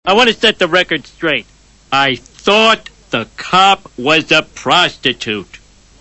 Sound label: laughter